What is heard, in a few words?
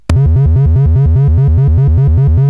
Die Alien Slime, CSG8580R5